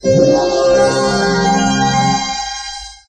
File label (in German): jingle